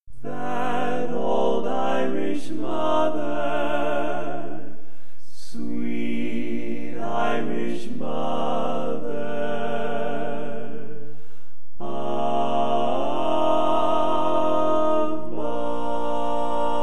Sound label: Bari, barbershop